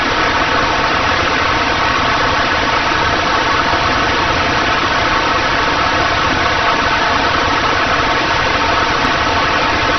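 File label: stationary noise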